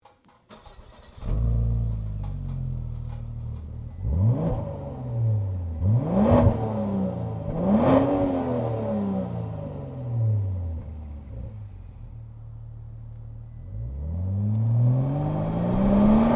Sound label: Volvo XC70